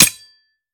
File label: melee world knife cement; viper